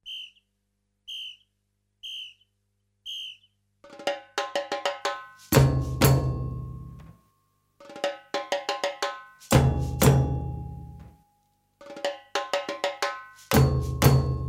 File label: groove